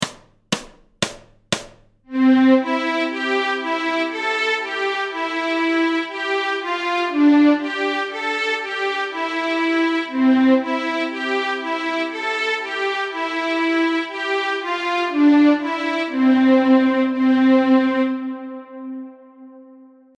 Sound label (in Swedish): Skojlåt Stråk C, Fiol; Notträning